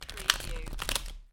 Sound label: Playing Cards Shuffling Cards Flipping Onto Table